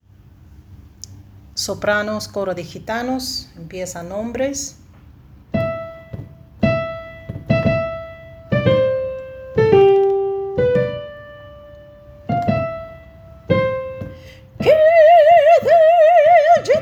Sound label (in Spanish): sopranos gitanos